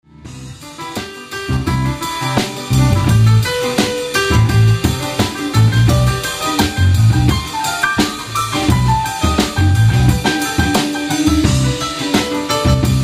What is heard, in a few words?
Piano